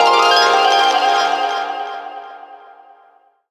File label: HRP TURN FX, Gbm; Rawcutz - Nu Forms